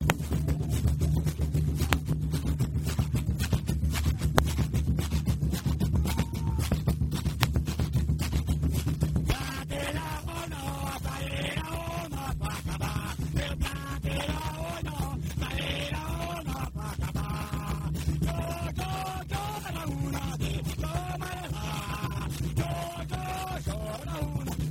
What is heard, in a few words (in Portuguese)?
carimbo; sao benedito; plantei arroz